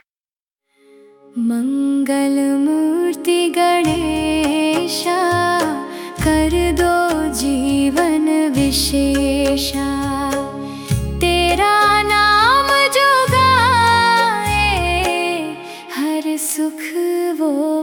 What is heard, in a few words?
Hindi , ringtone; ganesh